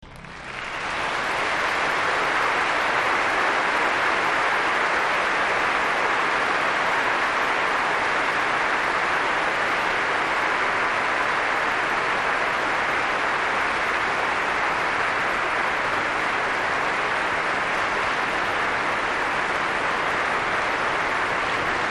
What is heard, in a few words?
Applause